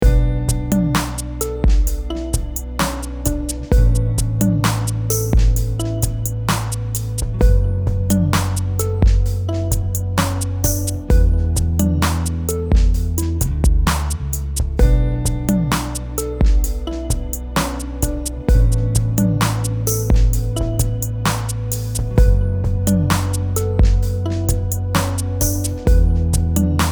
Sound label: loop sad